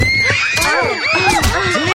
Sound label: little girls screaming